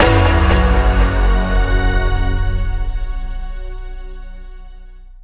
expanding wild tonal; netent

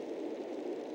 Reel General; netent

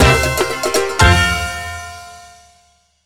big win end; netent

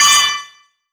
win symbol Flash; netent

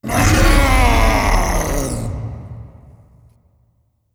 barbarian Win; netent

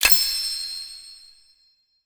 fs coin land click; netent

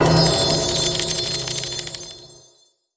start Screen Continue; Stikky; netent

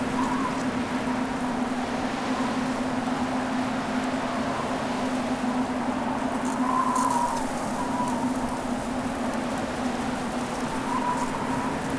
ice Ambience; netent

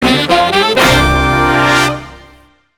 cash stack intro; netent

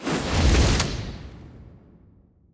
wild reel; netent

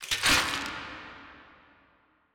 wheel Stop Click; netent

